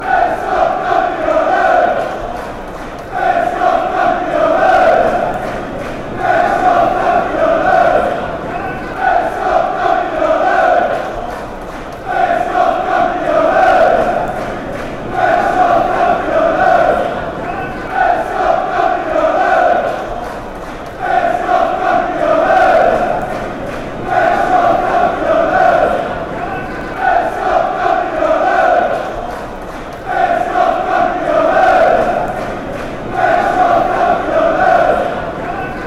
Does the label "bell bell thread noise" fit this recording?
No